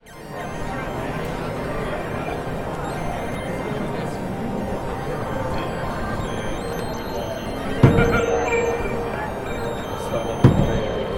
Milan's nightlife hip hop reimagining

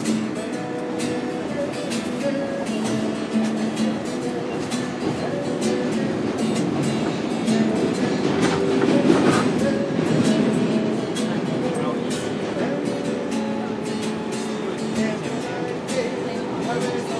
subway busking

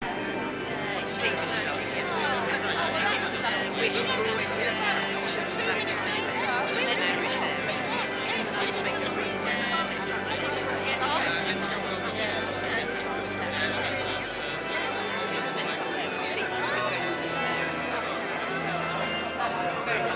organ and hubbub; at stalbans chrism maundy

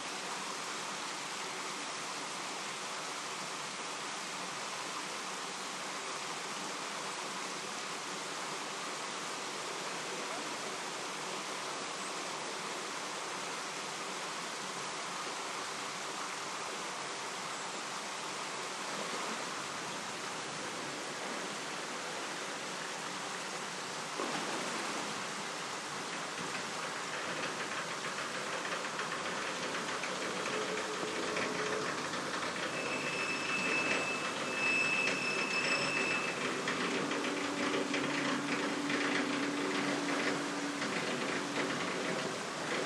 opening lock gate

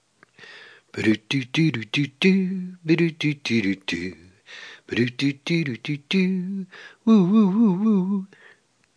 mystery tv theme

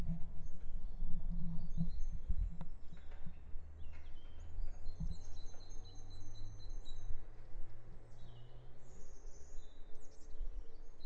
birdsong and clock striking twelve; tascam test

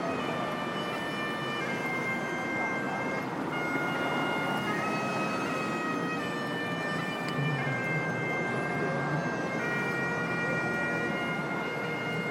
piped

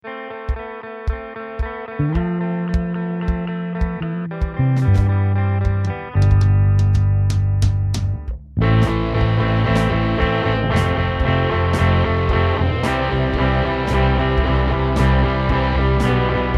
4/4 rock piece

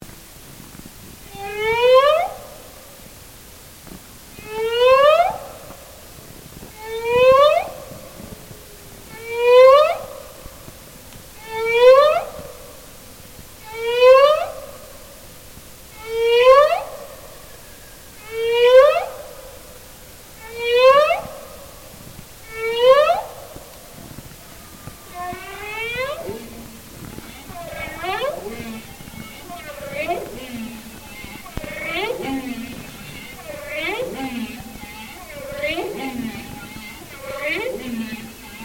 bowhead whale sings near Barrow AK

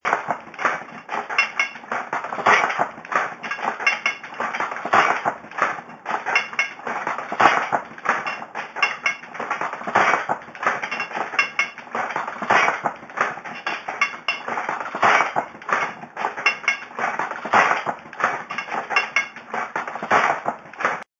1908 chandler price letterpress

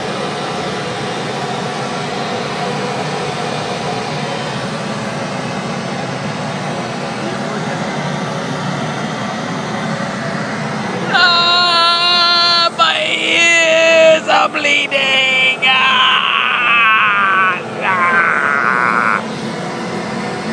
static

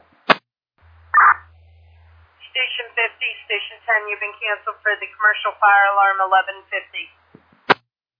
alert tones page received

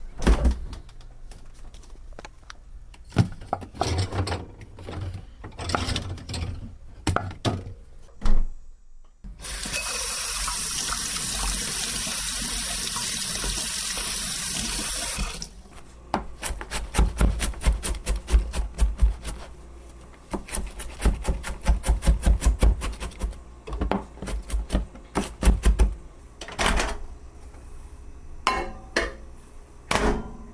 soundscape

